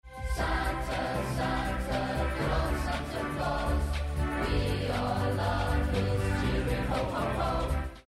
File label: Santa Claus song; Fenwick Fairytales Xmas Window